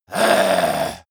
male die; koerpergeraeusche; menschen; soundpool

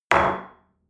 espresso place on table medium

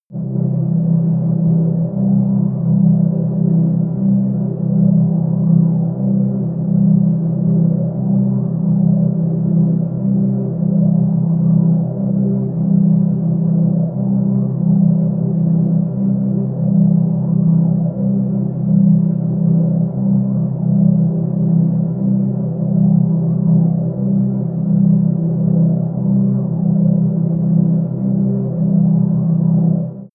under water, music elements

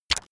playing cards flip; comedy-cartoon; spielzeug

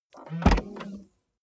car bmw e46 exterior trunk open short

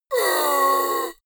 monster gasp; kreaturen

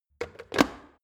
plastic water boiler lid on quick; maschinen; wasserkocher; soundpool

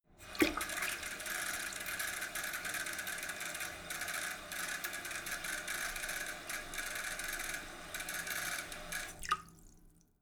sink fill splatter medium long; haus-garten; badezimmer

